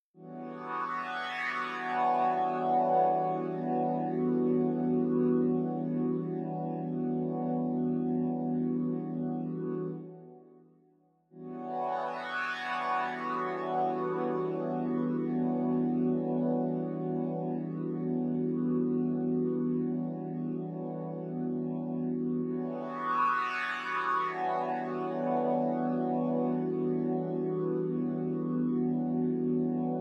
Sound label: synth